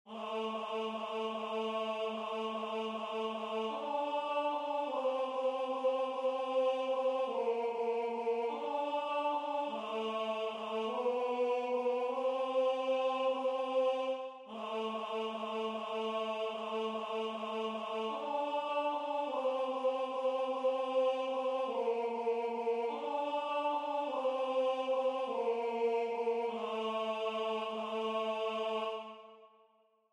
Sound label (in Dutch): Tenor; Kind ons geboren